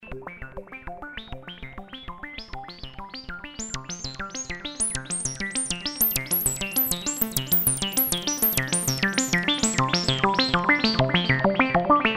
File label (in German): LFO triggert Sequenzer. Dessen CV versorgt 4 VCO's; modular synth